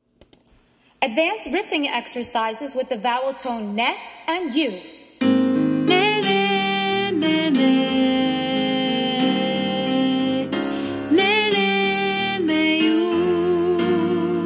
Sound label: advanced riffing b neh yooo